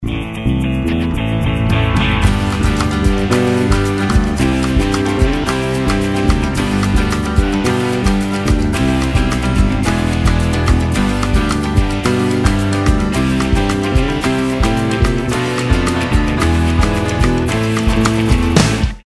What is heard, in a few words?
Ringtone Instrumental